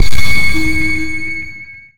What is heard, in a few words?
sonar Tail Water Close Shuttle; Barotrauma; Real Sonar